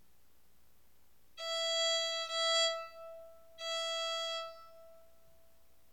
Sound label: violin E